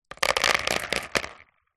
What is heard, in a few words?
leisure toy plastic bingo balls drop into plastic tub; zapsplat